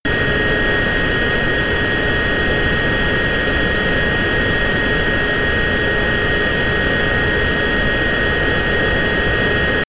plane bombardier private turbine full power direct